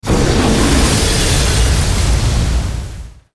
beam; ice tower pack

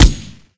minigun; camerman titan minigun

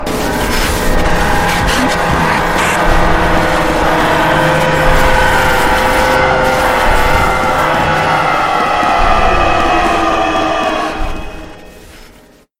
chasing start; huggy wuggy snpc

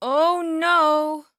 Discard

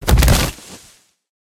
land, procoptodon; zoochosis pack

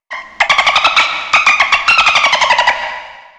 dolphin Spawn; zoochosis; whale

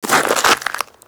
ice blast tick; ice tower pack

